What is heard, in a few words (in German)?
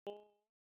Springen